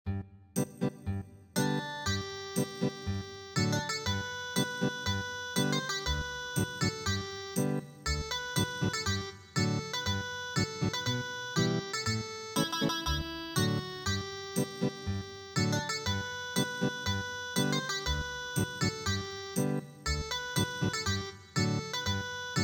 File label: Ethnic Guitar